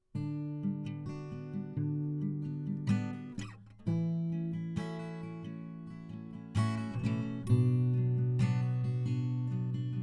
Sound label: audio technica at 2020 guitar; Audio-Technica AT2020 - Гітара